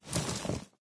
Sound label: rock, materials; ambient; Source Engine; video game